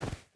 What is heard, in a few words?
footstep hide snow run; Rust